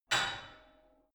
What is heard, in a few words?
barbell only lift up reverberant hard, fitnessstudio; sport freizeit